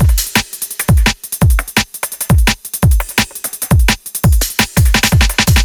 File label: Future Beat B, 170 bpm